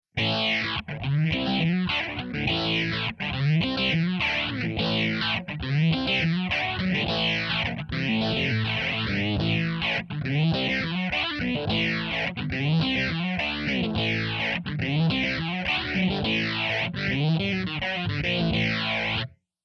Hard Rock Phase

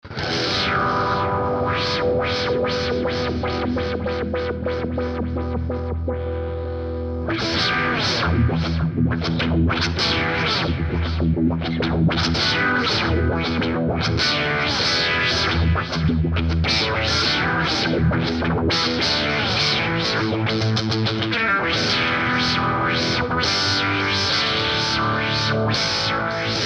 filter; VOX TONELAB SE

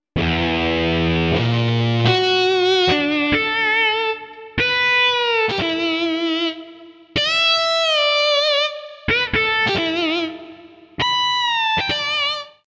over Driven Guitar

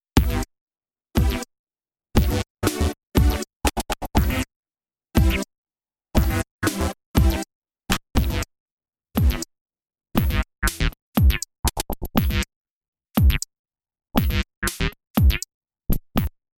Edgy Rock Cutz Trem

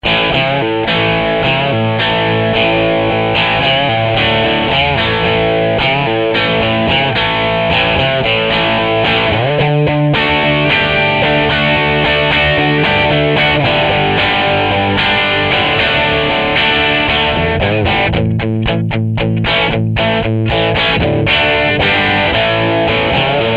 Marshall JTM-45

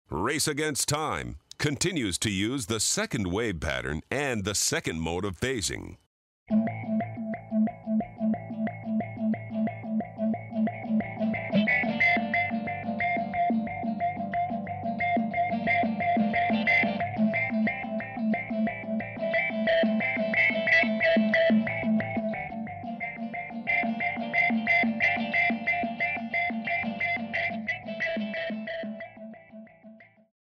Race Against Time

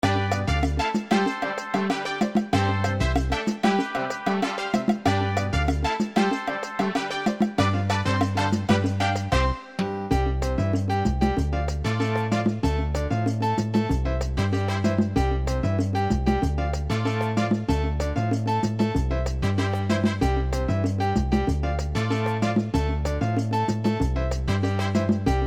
mambo

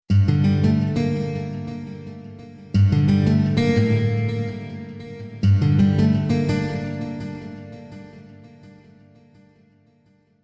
stack otto fx

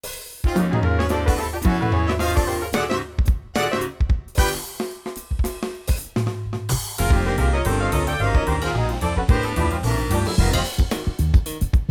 abstrakt

